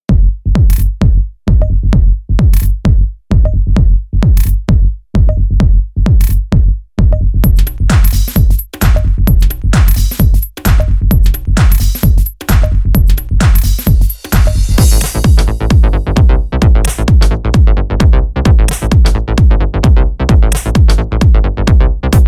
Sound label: tech trance groove